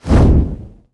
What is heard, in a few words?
corona